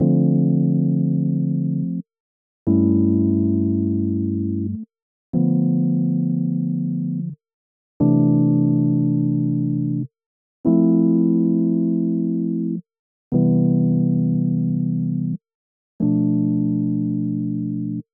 Dm90 LaFollia Piano

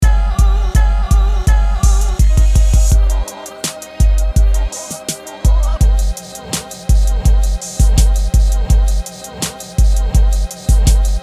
Drumbeat 83 BPM

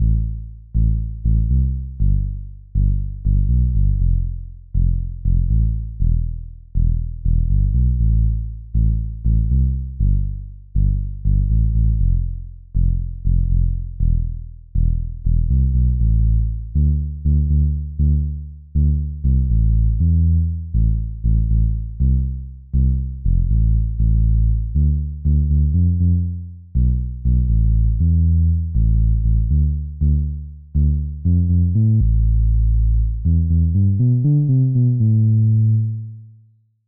G 120 Bass

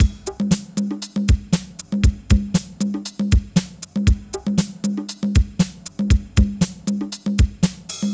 Funky Two-Step Beat

